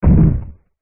fantasy giant single footstep distant heavy wood; zapsplat

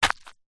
footstep single sandal woods ground stoney path; zapsplat; foley

